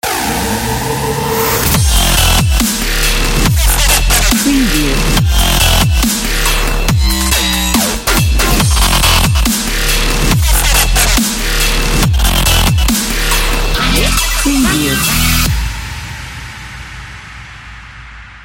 dubstep extreme logo

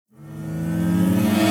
passing cars logo intro opener

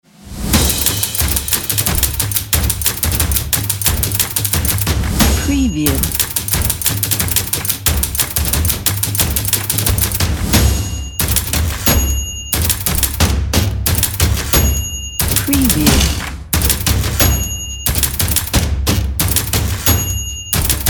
stomp claps typewriter opener

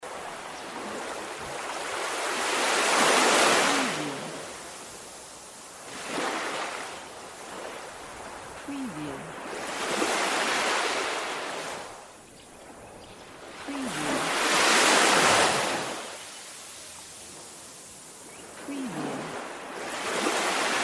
sea waves

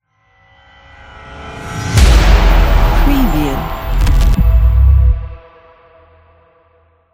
aggressive huge hit logo

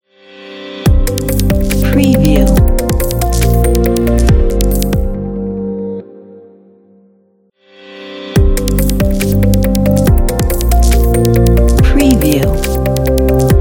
electronic logo intro

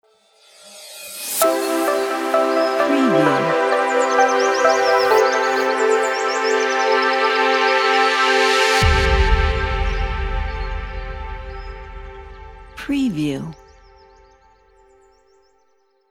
fantasy nature logo

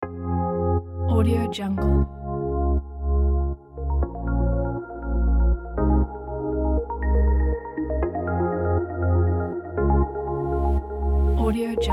emotive atmospheric ambience

